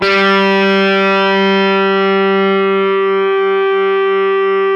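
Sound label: GUI StraDis G, guitar; Best Service Gigapack 2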